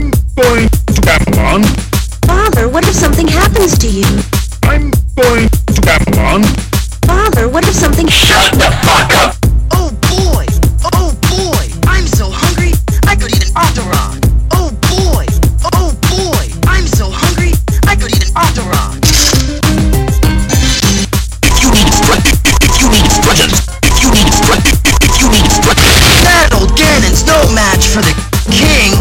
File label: Rap Of Gamelon